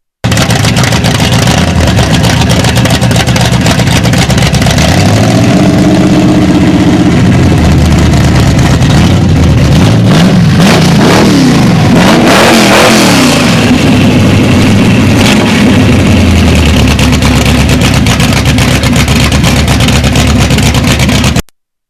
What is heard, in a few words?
camaro 1973